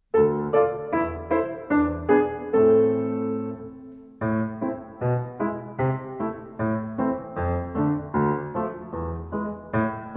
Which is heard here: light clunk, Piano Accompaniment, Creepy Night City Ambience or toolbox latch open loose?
Piano Accompaniment